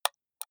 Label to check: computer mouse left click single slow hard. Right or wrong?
Right